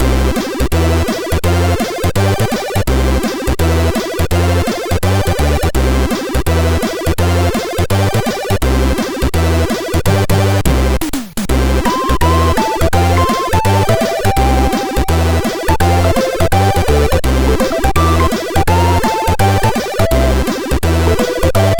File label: loader, MOS6581R4; Megademo